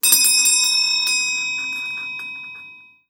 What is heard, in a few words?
Bell, trådløs/kablet ringeklokke; honeywell 9 series; honeywell dw915s kombi tradlos kablet ringeklokke